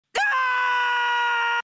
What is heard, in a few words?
scream; Rigby